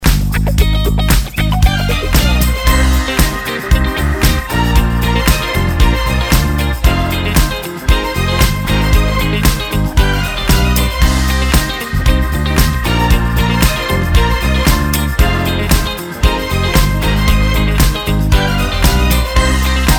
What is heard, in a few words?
funky music short; demonprey